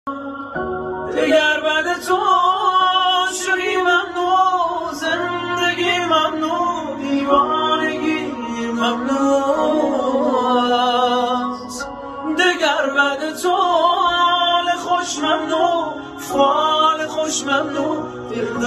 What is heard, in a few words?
hiss